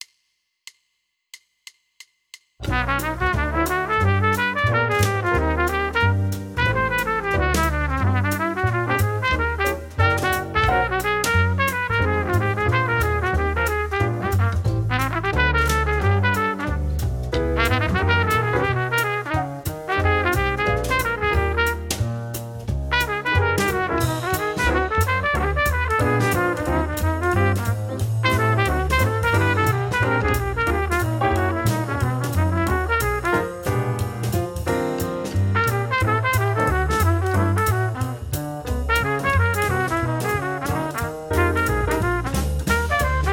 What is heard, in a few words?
Jazz Etude; Jazz Etude #7 Trumpet and Track